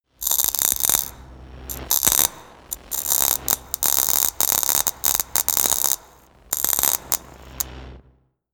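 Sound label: jam, electronic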